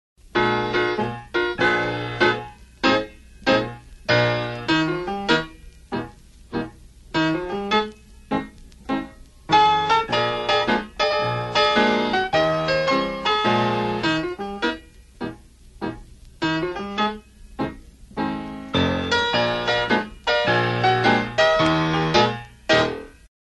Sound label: hop shuffle step combo